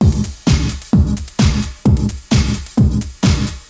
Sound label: Beat (130BPM), Gated Reverb; creative gate; musicradar